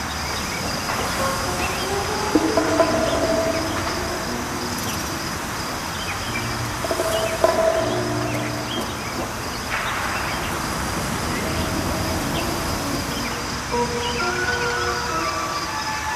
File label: Construction Ambience